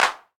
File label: clap, drums; piper; legacy engine